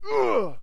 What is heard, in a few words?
Pain, soldier; hellenes